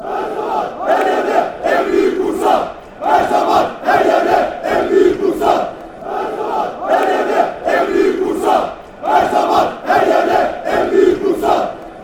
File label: bursaspor chant